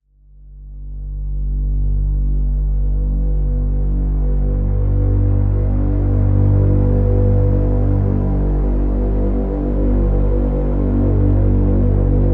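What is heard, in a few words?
drone; Interrogation G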